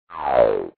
decrease; the independent

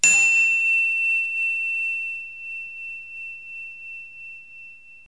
low fork; Wired for Sound Pro CD; Aristosoft, Inc.; music

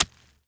Iron Pine; Tiger Woods

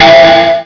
Orch blas; RivalBall for Macintosh

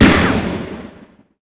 ast hit; ast miner

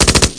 chain; PCGAMER Disc 2.8 SEPT 1996; SHELSHOK

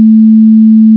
Sine; Widget Workshop; A Mad Scientist's Laboratory; Maxis

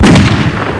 handgun; worms dc; tykes

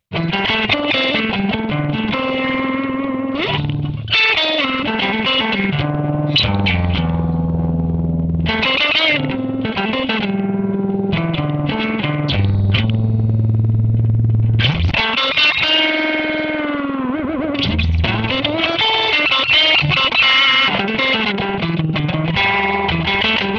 Ultra Fast Flange

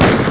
pistol

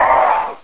SCRP HIT

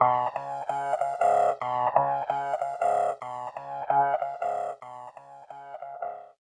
MELODY; Chillout; Future Music

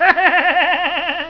Giggler Noise; BOOM

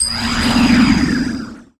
female celebrate; Earth and Beyond; EA Games

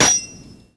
SOUND BLADE HIT; BloodRayne

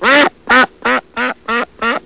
jump; HALAF; 501 Great Games - Volume One; Guildhall Leisure Services

